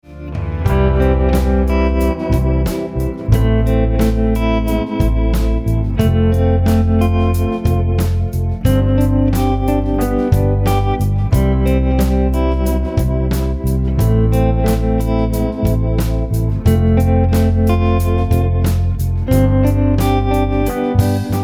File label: Gretsch Fender; Gretsch 5120 Electromatic TV Jones